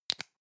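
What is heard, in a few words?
lipstick close, Bathroom; haus-garten; badezimmer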